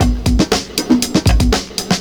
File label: PERCS, 70 s Breakbeats